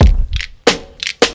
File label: 99 bpm drum loop c key, Free drum loop - kick tuned to the C note. Loudest frequency: 1242Hz